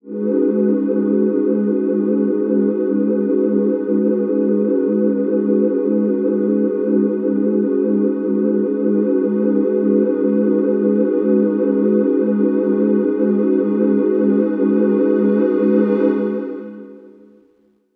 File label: synth pad loop pulsing glass, 106, Ab